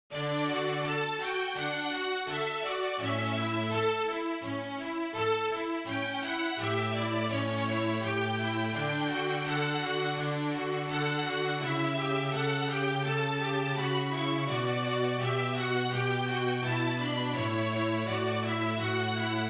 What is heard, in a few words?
String Trio, MIDI; (String Trio Version); Largo from Winter - Vivaldi